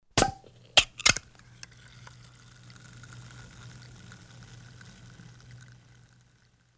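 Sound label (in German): sodadose öffnen; haushaltsgerausche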